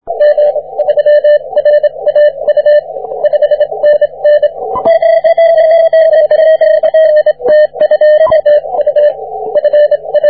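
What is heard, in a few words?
40m continuous wave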